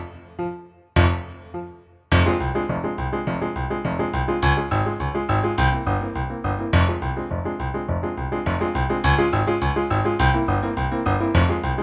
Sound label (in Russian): Горский СКА